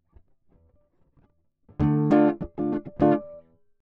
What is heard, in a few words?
Small Bright Combo; logic